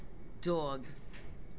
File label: dog; animals